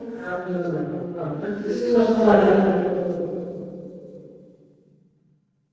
crowd murmur

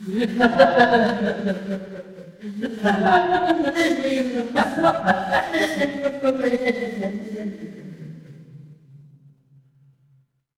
crowd laughter

